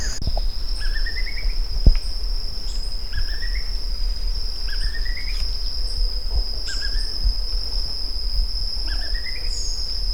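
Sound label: Dysithamnus mentalis rising call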